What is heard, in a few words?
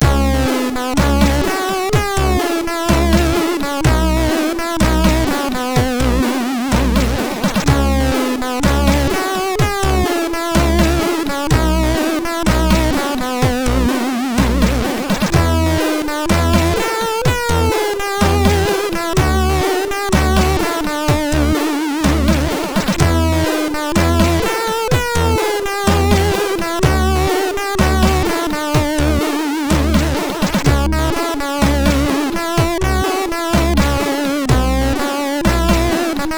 Reggae sid MOS6581R4